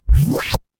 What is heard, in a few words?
ui undo